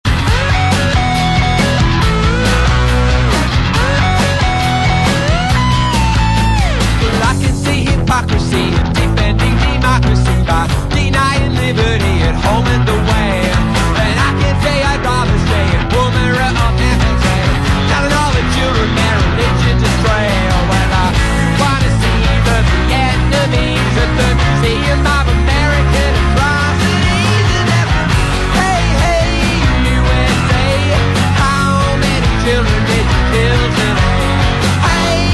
Chanson US

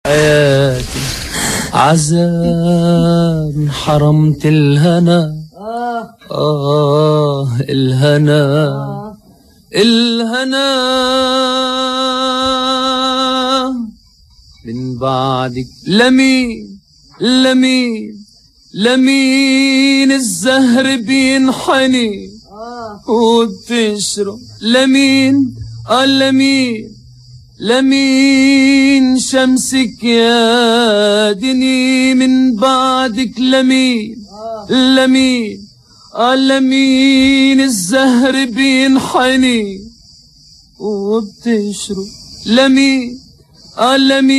Chanson arabe

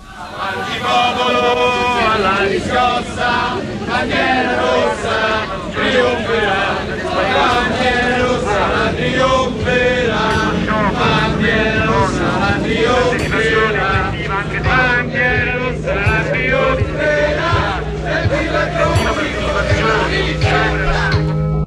Chant révolutionnaire italien

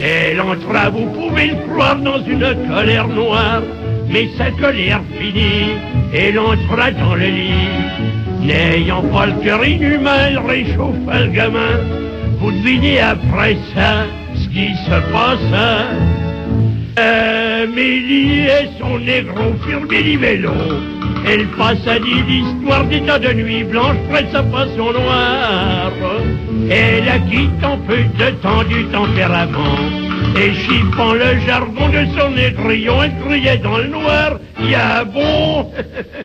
Chanson

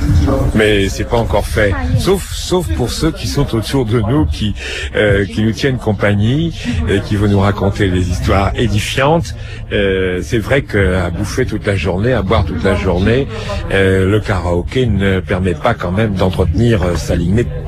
Chine karaoké

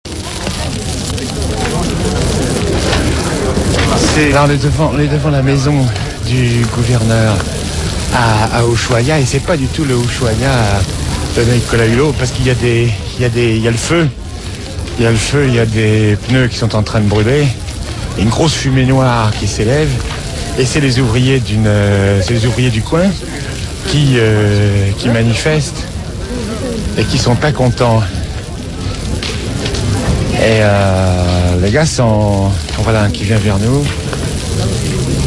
Manif ambiance; Ushuaya

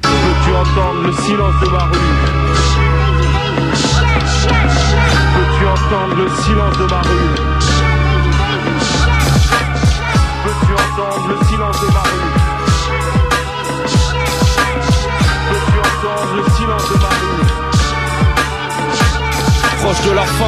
Chanson rap

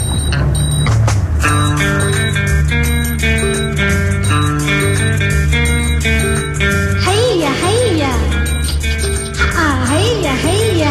Chanson indienne

